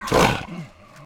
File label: Cat; Treasure of Nadia